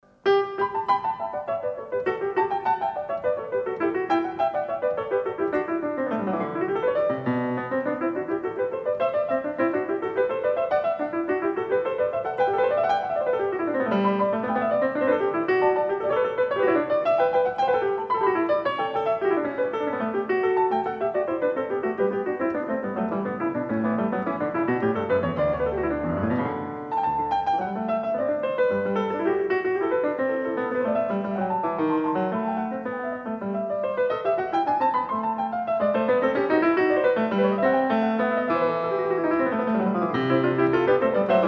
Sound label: Bach Goldberg Variations